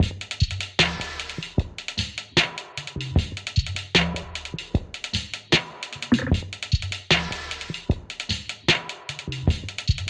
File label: DrumLoop